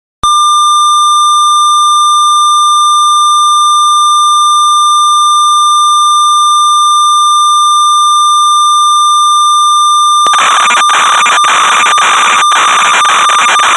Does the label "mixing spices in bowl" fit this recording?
No